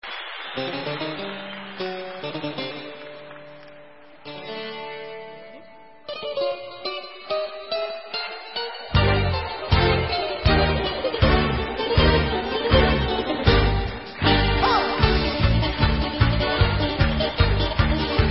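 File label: Instrumental, Israeli Dances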